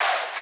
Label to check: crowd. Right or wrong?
Right